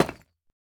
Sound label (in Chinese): 深板岩砖 放置声